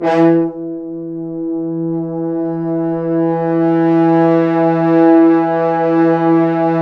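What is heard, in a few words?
French Horns sfz; Roland L-CDX-03 Disk 2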